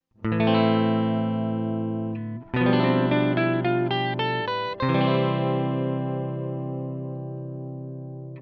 dim progression; fretjam

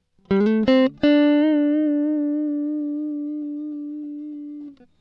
vibrato exercise; fretjam

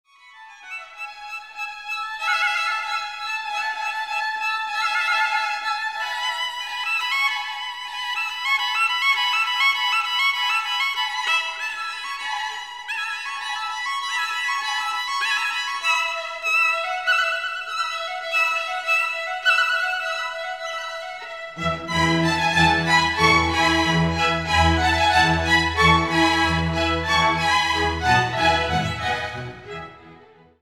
violin concerto in e major spring allegro; vivaldi

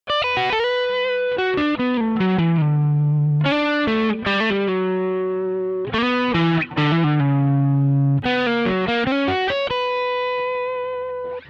arpeggio

